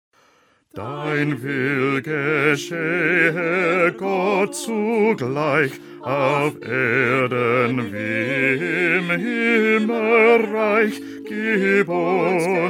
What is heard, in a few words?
Bass